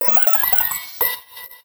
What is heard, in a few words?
level end; rabbit samurai 2